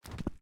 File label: mobs landings